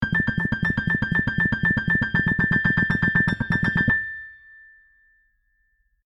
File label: Piano Noise, ringtones; Motorola; Edge 70 Max China